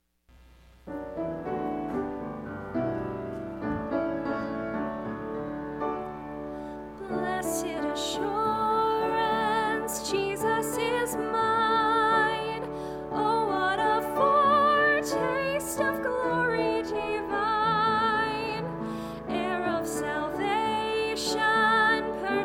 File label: blessed assurance vocal